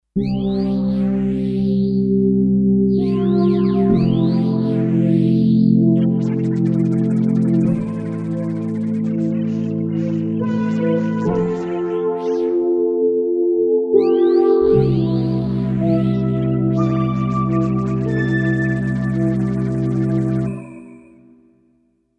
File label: New Ghost, Kawai K5000S factory presets